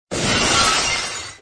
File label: glass shatters